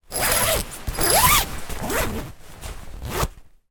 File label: foley sleeping bag zipper